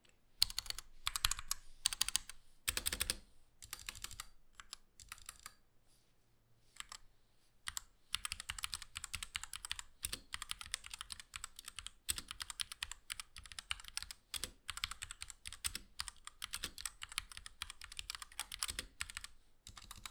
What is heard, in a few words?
Keyboard Sound Test, royal kludge rk84; royal kludge rk84 review